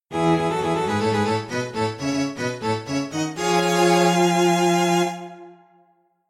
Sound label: fanfare